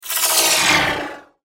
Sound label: Whoosh Sweeper Fly By; Blastwave